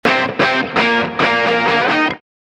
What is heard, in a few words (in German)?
Soundlogo Solo E-Gitarre; Gaffel